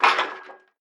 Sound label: Metal Hit; Lucius III